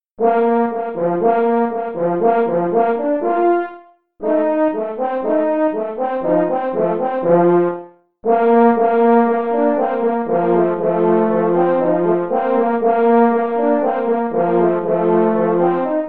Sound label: Easy Classic Duets Allegro, French Horn